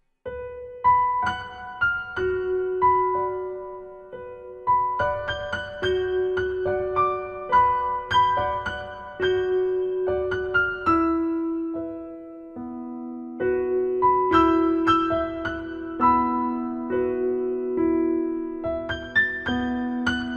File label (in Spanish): musica de terror, epilogo; creepypastas sin copyright